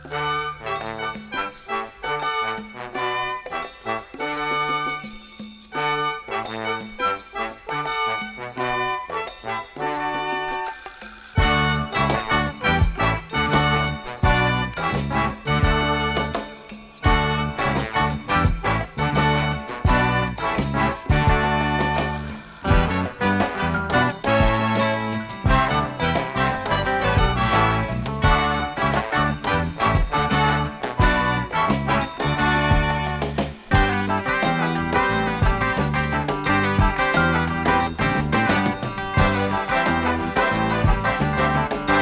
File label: Jig